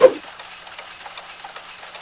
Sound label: knife slash; spknife